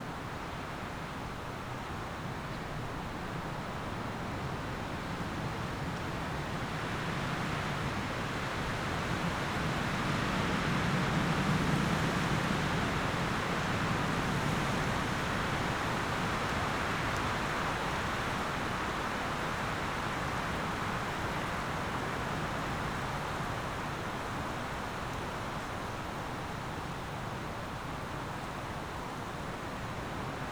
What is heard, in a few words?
HEAVY WIND BIRDS FORREST; The Soundcatcher; 365 Various Sfx